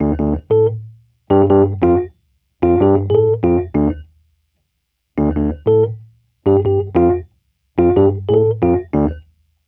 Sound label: 93 D min Rhodes